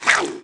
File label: Mutant Stab Attack; Valleakan Mutant 1; Aliens